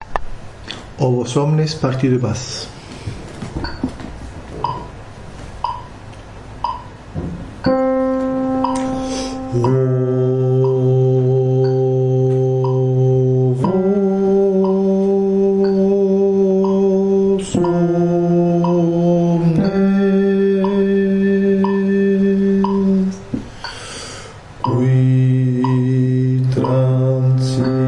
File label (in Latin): basse